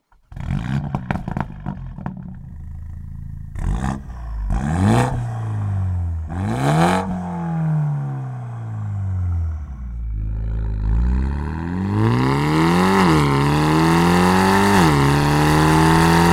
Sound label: Link Pipe Set BMW M135i